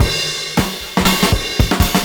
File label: CYMB, Breakbeats; USB Soundscan vol.46; AKAI